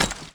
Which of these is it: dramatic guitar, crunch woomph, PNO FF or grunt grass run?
grunt grass run